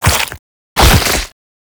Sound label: Proto Infested Mutalist Speaker Start Flesh; Warframe; Abilities